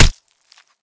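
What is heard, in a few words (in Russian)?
постановочный удар ножом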